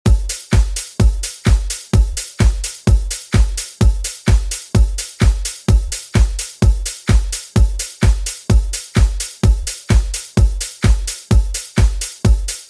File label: Xmas Redrum 128Bpm